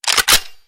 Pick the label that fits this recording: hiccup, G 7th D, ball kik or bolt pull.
bolt pull